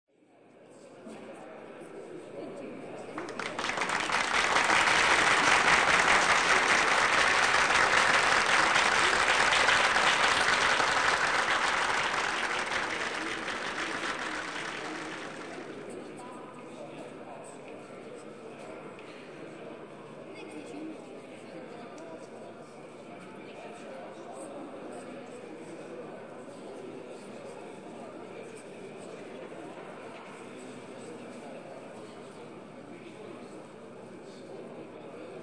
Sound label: Lounge Crowd; Surround; 3 Martini Lounge